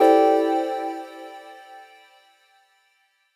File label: Clear C0; Mixolumia